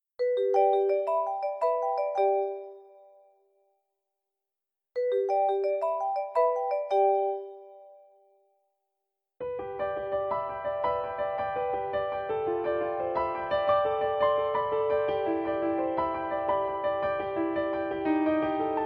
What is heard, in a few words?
Marimba Piano; Variations